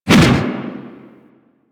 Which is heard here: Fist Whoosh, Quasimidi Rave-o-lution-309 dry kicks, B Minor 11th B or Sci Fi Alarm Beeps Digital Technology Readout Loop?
Fist Whoosh